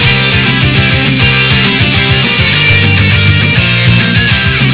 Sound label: czardasz; rocks n diamonds